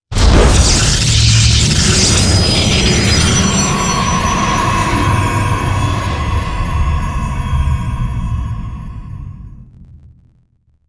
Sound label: warp start